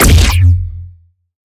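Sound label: Sci weapon Shot Medium Blaster Power Charger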